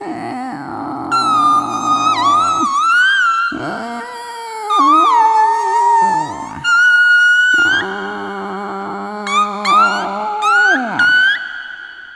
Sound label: Arrythmic Atonal; Sonic Foundry (Sony Creative Software); ILONA